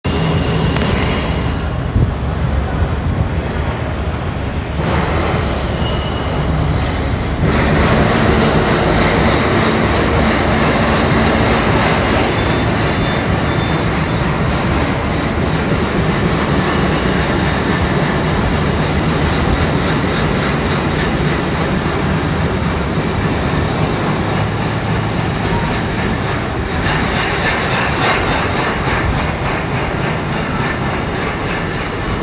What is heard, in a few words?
Drilling